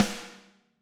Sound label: Snare Hard